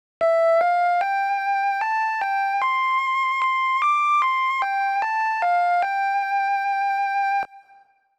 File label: The Poke Flute